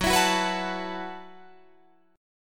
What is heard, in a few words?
F sharp Minor 11th, Guitar, Drop D